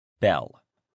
bell

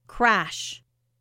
crash

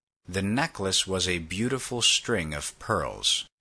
string

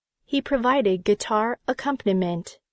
guitar

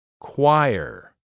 choir

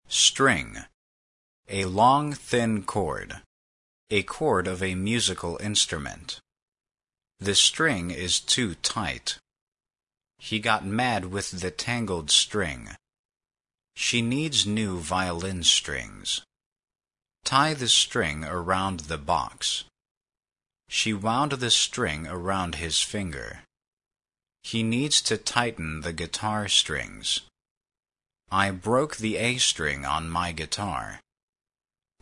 string

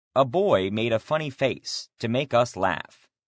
laugh

